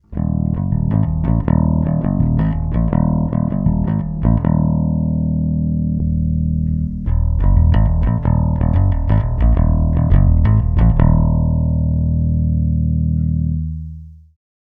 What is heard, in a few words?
eq off-on, bass amp; palmer pocket bass amp